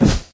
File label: Ghost Puff; Cut the Rope Holiday Gift; android ios mobile gamerip